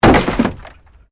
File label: WRECK PANEL IMPACT PANEL HEAVY, Wrecked Cars; Wrecked Cars, impacts, crashes, scrapes; SoundEffects-Foundation4